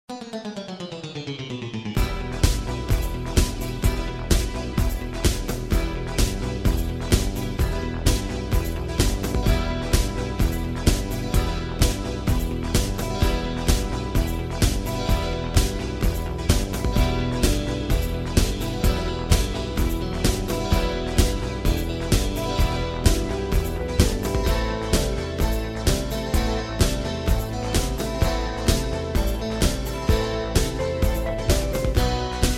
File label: music